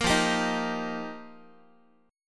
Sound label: F 7th Suspended 2nd Suspended 4th, Guitar